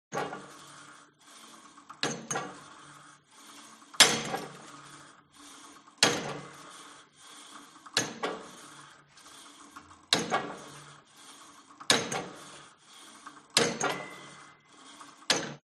SPORT GYM Cable Machine Old Pull And Drop Sequence Hard; smartsound